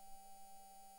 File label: mic, mars2020 edlcam